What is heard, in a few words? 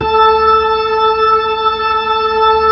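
B-3, Hammond B-3 organ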